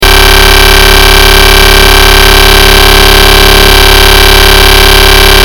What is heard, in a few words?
atmega8 signal; eeg